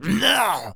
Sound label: Zombies Male Jump; zps; Male1